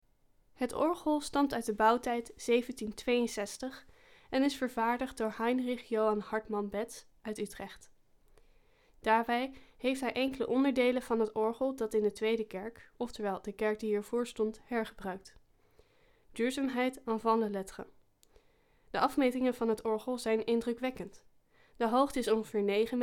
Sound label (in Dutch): het orgel